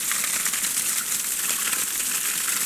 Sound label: Ice Cave, On The Glacier